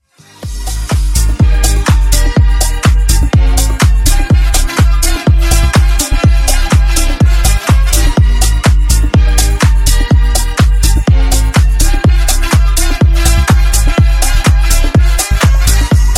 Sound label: Disko Funk Remix 124 bpm